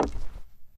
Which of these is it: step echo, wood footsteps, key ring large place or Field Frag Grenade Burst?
wood footsteps